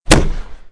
SFX Enemy Death

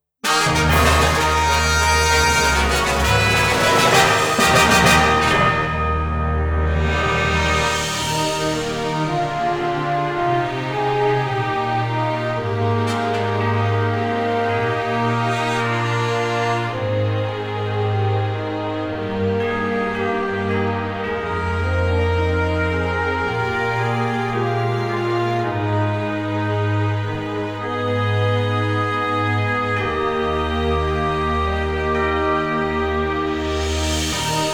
Victory!; Top Champion Geeta

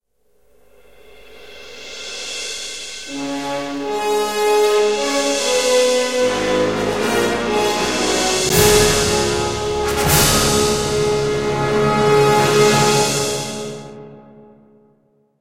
Guitar Power; Back to the Future The Game; Android iOS PS3 Wii Windows Xbox 360 gamerip 2010

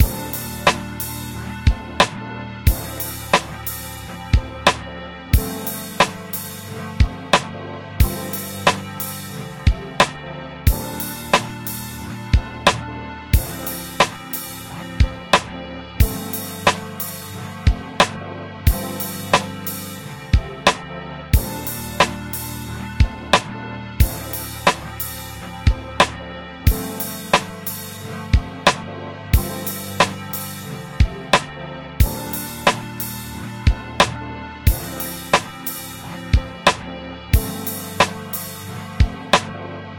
BGM Old; life is a game android

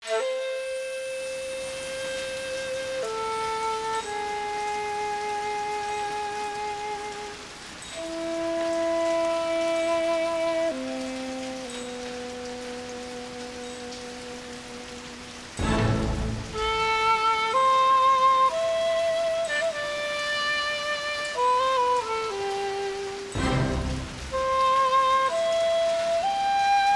Pounding Rain; Samurai Spirits Zankurou Musouken; saturn

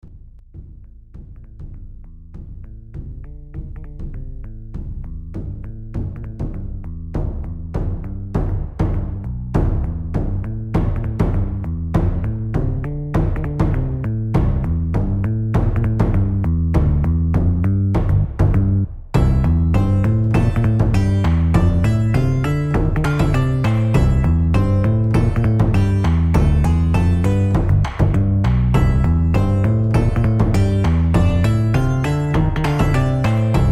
Taiko Boogie; here comes niko original game soundtrack 2021

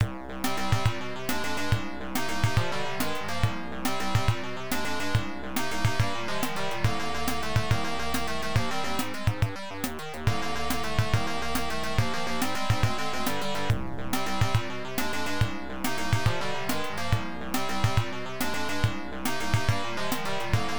Metal Creature; hydefos hyper defending force system msx2 opll

